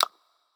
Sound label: mouseclick; Colin The Cat; wazdan; ultrafast